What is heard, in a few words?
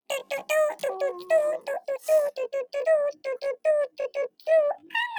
anoying sound